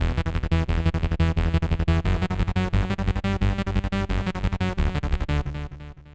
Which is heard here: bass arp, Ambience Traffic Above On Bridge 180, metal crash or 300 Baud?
bass arp